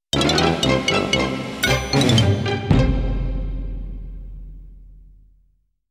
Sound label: Happy Ringing, chiptune; Playstation; Parappa The Rapper